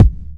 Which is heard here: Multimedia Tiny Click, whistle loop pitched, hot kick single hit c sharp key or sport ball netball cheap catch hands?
hot kick single hit c sharp key